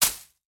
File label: flowers break